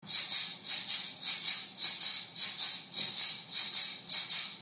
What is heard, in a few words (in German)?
Shaker Fuspercussion